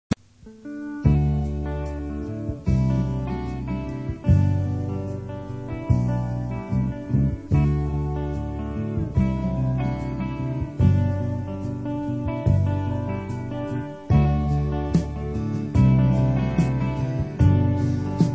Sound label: whistling